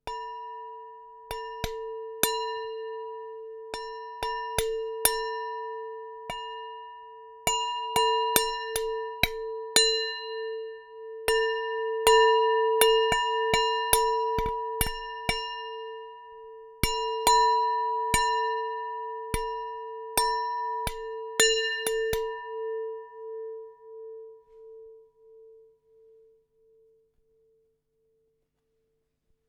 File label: Drum Stick Montage Hits, Large Wine Glass